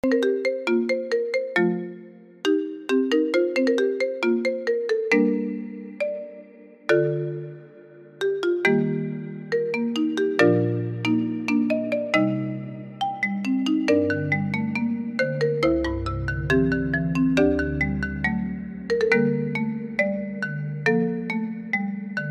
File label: Marimba; Demon Slayer